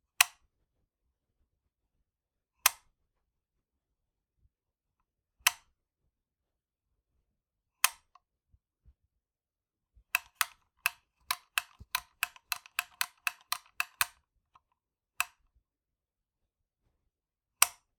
Light Switch On Off

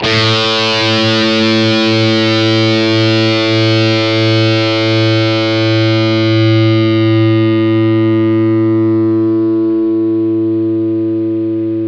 Dist Guitar A

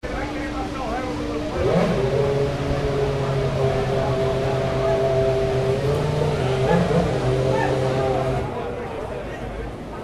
F1 Starting Engines

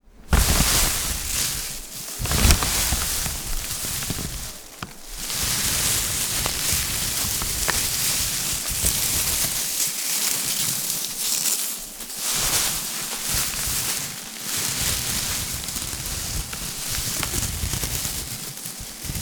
Confetti Rustle

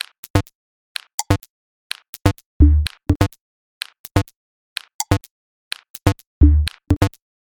126 Min loop Dm

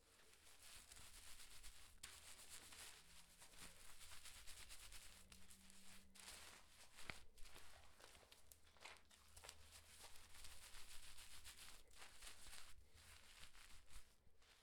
Washing Short Hair

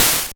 8 Bit Soft Impact

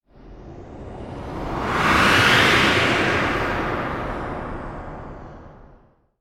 Slow Blowing Whoosh

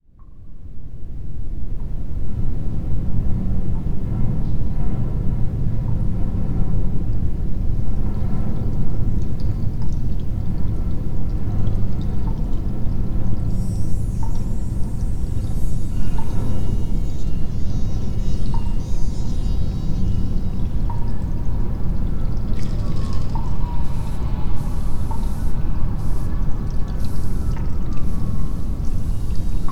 Subway Soundscape

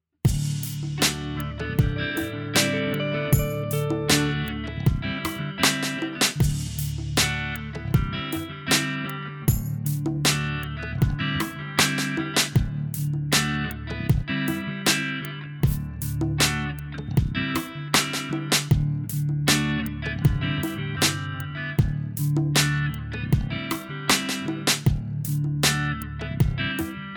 Pop Background Music

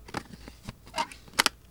Cd Box Open Close

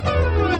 Woosh

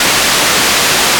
White Noise

